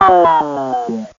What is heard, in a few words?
talk